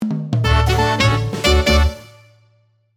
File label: Title Jingle, acoustic; 元気なジングル; アコースティック